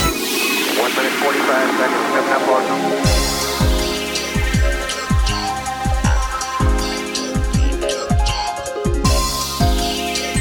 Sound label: Bossa antique; Technictix